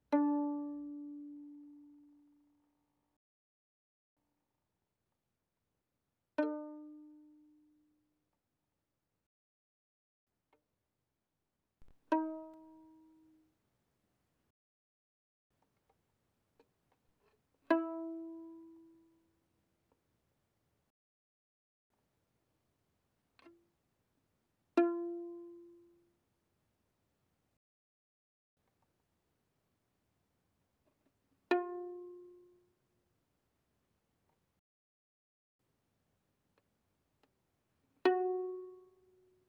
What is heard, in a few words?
Violin pizz mf sul D D4 Ab4